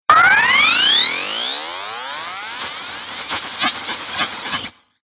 Computer Interface Telemetry Static Start Up Digital Computer Riser Spacecraft; Tom Hutchings